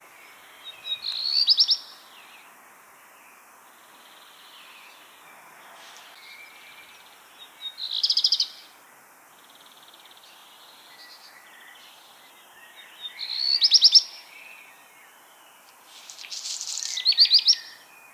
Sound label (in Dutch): zwarte roodstaart zang